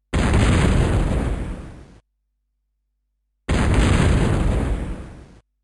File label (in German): Geschuetz; Militaer